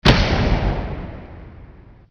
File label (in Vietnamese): Tieng no cho phu de video